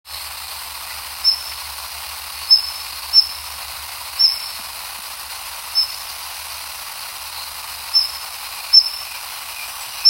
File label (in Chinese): 黃尾鴝